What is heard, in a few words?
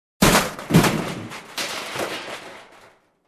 Smashed Debris Sound